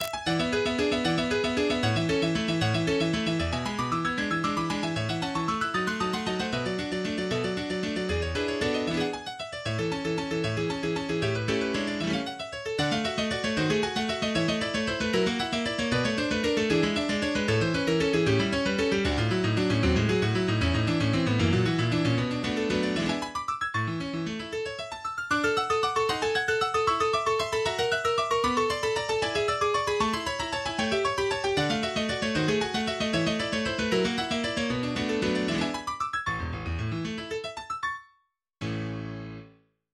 Prelude in A major

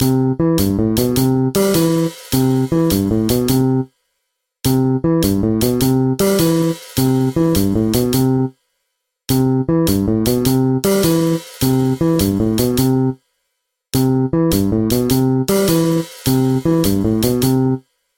Pipe

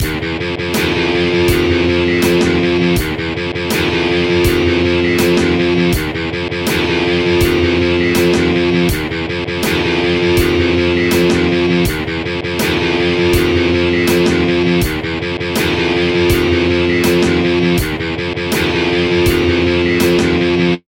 Riff

